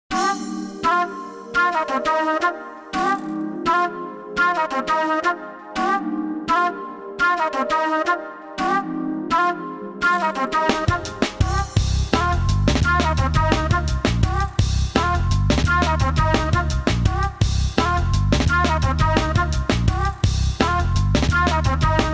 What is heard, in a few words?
Brit Horns; Brit Horns - The Licks; Reason ReFills